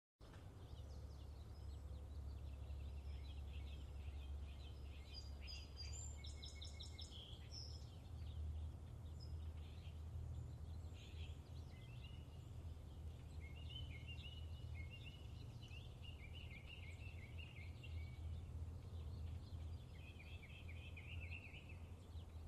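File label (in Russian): пение птиц в пригороде или большом парке недалеко от городского трафика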